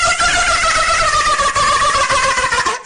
dolphin laugh

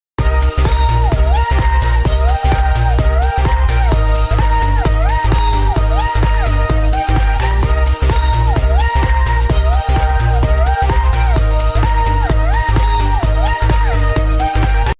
ninja emote

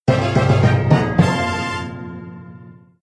end win; brawl stars

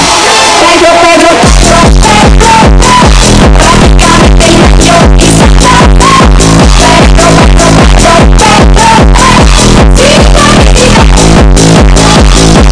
bass boosted

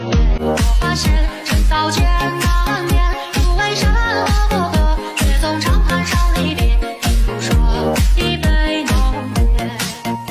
dance

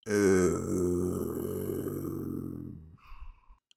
euuuuuh pfffff

